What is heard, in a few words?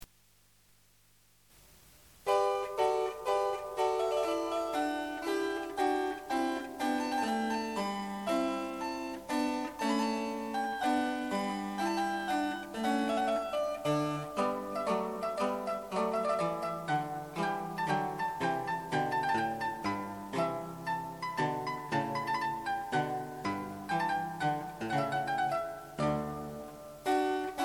harp